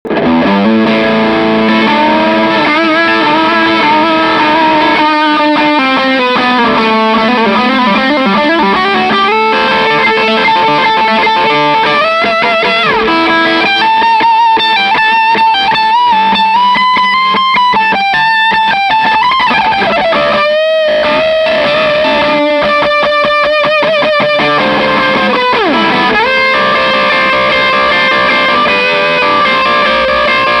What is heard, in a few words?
Solo; Naylor SD60